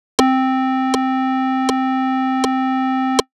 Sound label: Rhythm Note